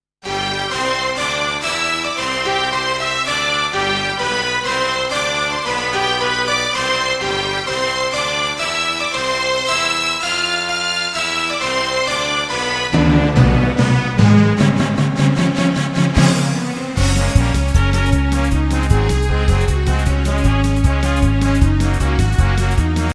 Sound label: overture; BYE BYE BIRDIE